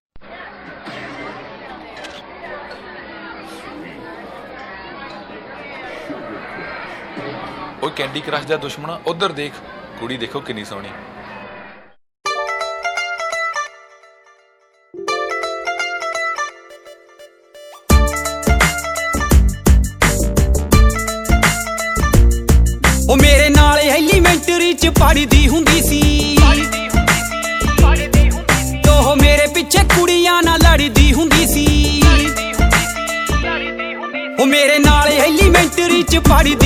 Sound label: punjabi songs